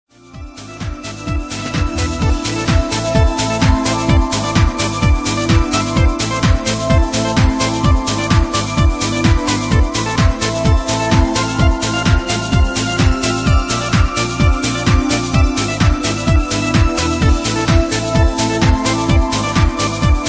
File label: instrumental version